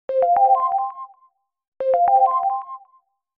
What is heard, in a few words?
Alarm; Microsoft Lumia-550